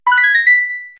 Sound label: Mining All Found